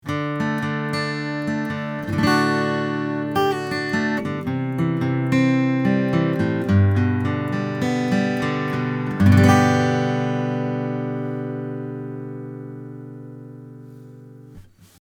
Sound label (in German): DPA 4060 AGit Picking 50db Gain